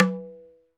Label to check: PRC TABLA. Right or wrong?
Right